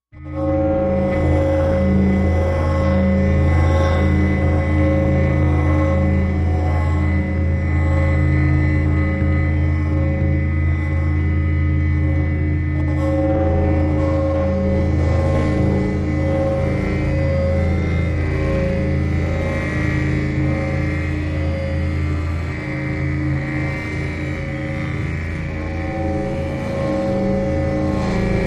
Core Dreams, Machine, Space Ship Engine, Ambient, Atmosphere; Motor